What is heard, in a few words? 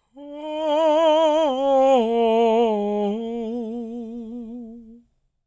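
falsetto, Vocal Planet Jazz FX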